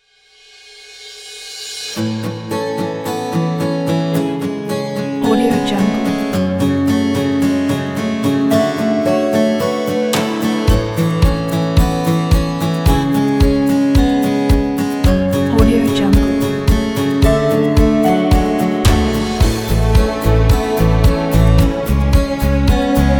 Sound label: motivational uplifting music